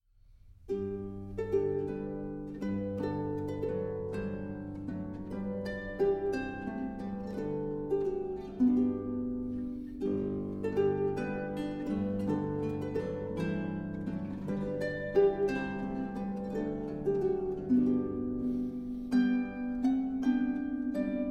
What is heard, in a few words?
A New Irish Tune in G Major; Purcell; Lilliburlero, Z. 646; Transcr. For Ensemble